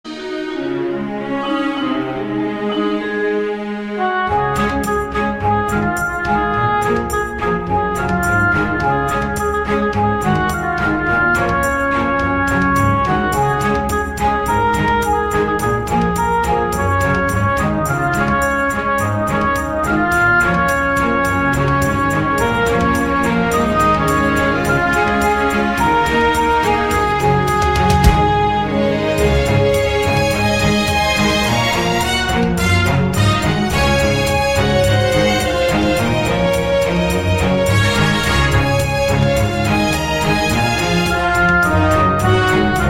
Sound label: Orchestral Remix